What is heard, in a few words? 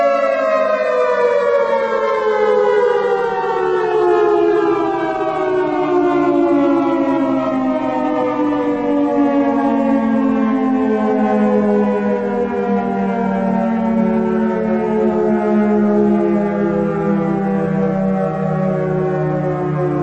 siren attack tone